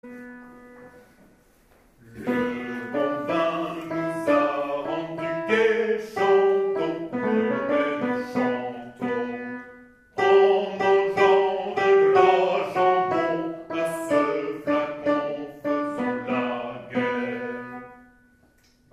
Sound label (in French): Tourdion Alti